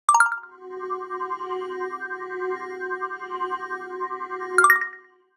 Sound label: scan pass